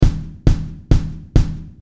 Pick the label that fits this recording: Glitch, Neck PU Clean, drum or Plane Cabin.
drum